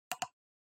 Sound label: PC Mouse C